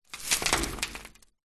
foley small stones debris dust handful sprinkle drop metal surface; zapsplat